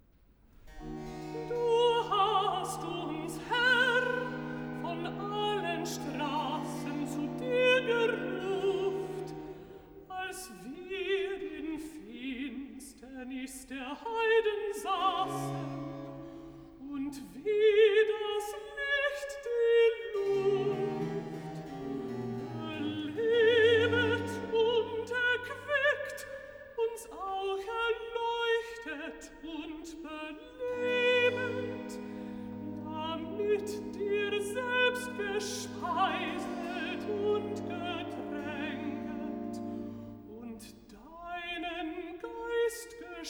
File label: Recitativo (Alt), BACH for ALTO; Die Himmel erzählen die Ehre Gottes; Du has uns Herr von allen Str